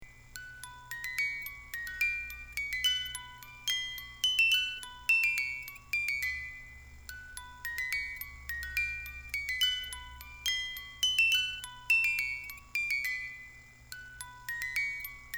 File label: music Box